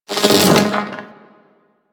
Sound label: Projectile Whizz By; Enemies; Abilities; Sentient Brachiolyst